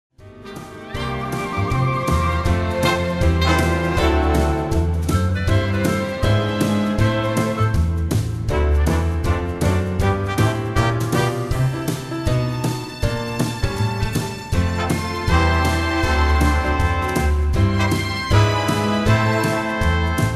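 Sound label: karaoke instrumental; princess; disney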